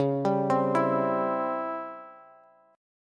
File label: Db Minor Major 7th Flat 5th Db down Bass; DbmM7b5 arpeggiated; JGuitar